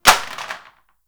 Plastic Drop

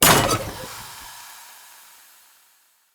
Cage Open; Encounters; Animal Rescue

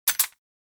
Emplacement Mech; Grineer; Weapons

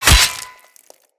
Melee Axe Impact Flesh

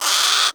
Sea Bird Tranq Dart Grunt; Characters; Warframe; Ostron; Hunting Critters

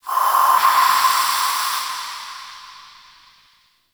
Zariman Steam Blast; Warframe; The New War

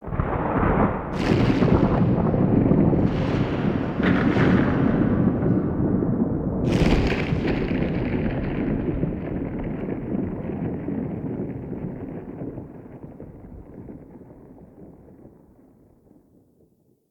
Boss Warning Thunder Stinger; Level Events; Midrath

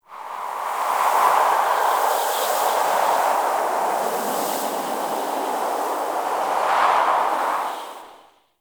Venus Landscape Wind Gust Low, Ambience; Warframe; Venus Open World

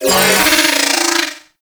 Riot Moa Vocal Pain; Warframe

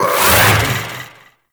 Metronome Cast; Amalgam Moa; Abilities

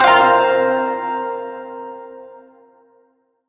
Shawzin Hirajoshi Chord I; Warframe

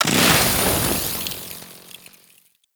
Kusarigama Ignite

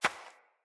Rat Guide Step, Foley

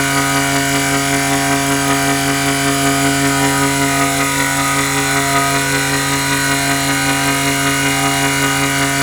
Injection Arm Mover Loop; Ambience; Warframe; Grineer Ocean; Ocean Sabotage Injection

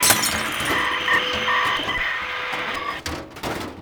Destroy Television; Warframe; Ambience; NineteenNinetyNine

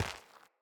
Mockery Walk Gravel, Footsteps; Mockeries

